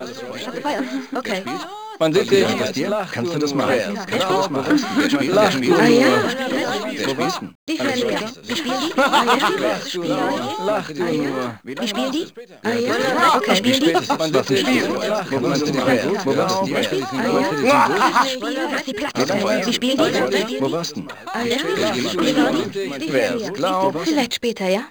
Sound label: hubbub, Crowd German